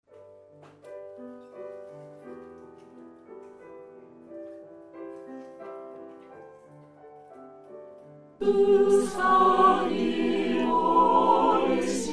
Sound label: chorus